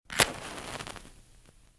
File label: ignite, fire; Minecraft; Votive World